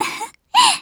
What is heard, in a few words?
laugh; Eternal Return; Tia; JPN